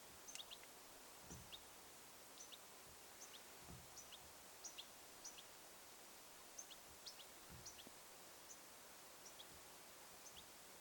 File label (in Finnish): tiklin lentopoikanen kerjaa tietaakseni